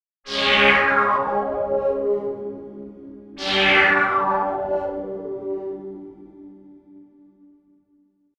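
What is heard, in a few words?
intro sound effect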